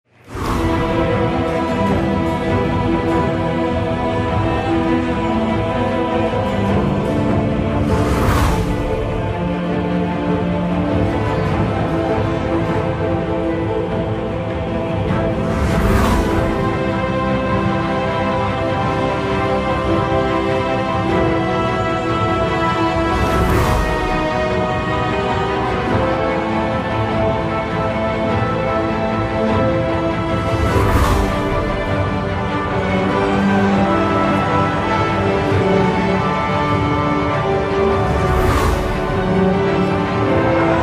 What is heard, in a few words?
Introduction, Music; WoW Battle for Azeroth